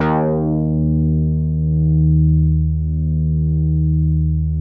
MEMORYMOOG

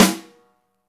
snare, Roland

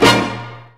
Brass Hit; Proteus 2000; Digital Sound Factory Proteus 2000

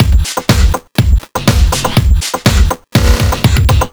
122 Full, Drum Loops 122bpm; Random Phunka; Nu Dance Electro

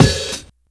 SNARE HAT OP CL; E-MU Formula 4000 Series Vol. 1; Hip Hop Nation; Hip Hop Kit 2

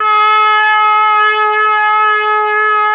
BRASS; ILIO; Propeller Island Legendary M400

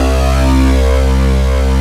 THICK SYN; SYNBASS

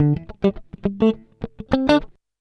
104 FUNKY, Electric & Acoustic Guitar Loops

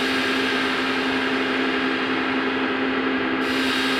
sparse soundscape samples; ReLooped

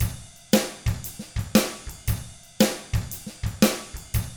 SHUFFLER, Drums; ILIO; Double Platinum Drums 1; 116 SHUFFLER

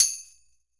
Roland MV8800 percussion

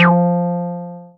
Synths BASS

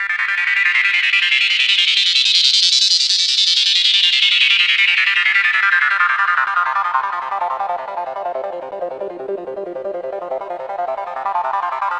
gro 160, Hardcore Loops, Arpeggios; Dance Synths

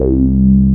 A BASS; CMP - Analogon

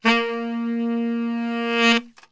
A#1 SAX, Brass Super Section; Best Service

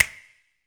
HMM, Human Perc, Body Perc; Spectrasonics; Supreme Beats; World Dance

